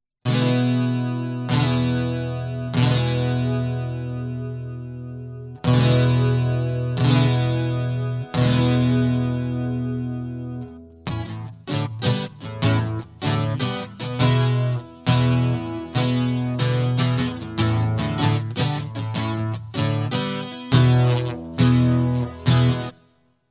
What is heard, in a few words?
Hammer On E Prelude